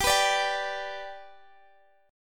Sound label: G Major G down Guitar DADGAD